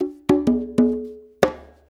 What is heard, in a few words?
Blues Perc Loop; Drum Tools